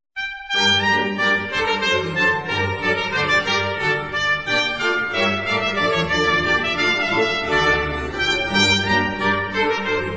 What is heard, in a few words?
trumpet; western